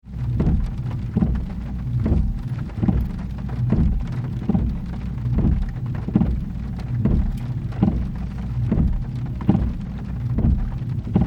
Windscreen Wipers, Rain on window, Various different speeds; Car